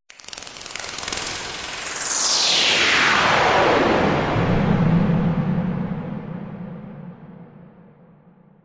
cicada wing flap into reverb; Electro and Synthetic

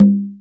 CONGA, Electronic Jazz Kit

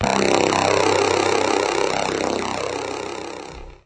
hard warble, synth

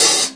Brush kit, Electronic Jazz Kit; STYLE KITS (Hip Hop, Etc)

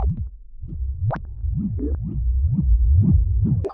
whopple beat; LOOPING AMBIENCE

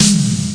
sp12, EMU SP 12; Kult

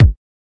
kick

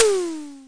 Simmons SD-5 drum machine

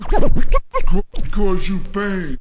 turntable; Scratching and Turntablism

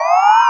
Electro FX; Ueb HE Electro FX

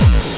obnoxious kick

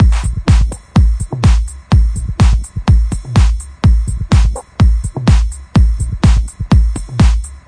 hard kiks and noise pan toms hats claps

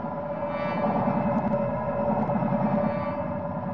panning ambient fx space; LOOPING AMBIENCE

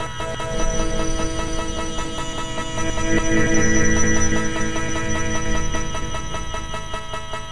bright modern pulse loop; LOOPING AMBIENCE

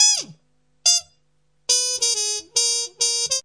acid jazz trumpet

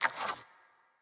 big space perc; ETHNIC and WORLD PERCUSSION

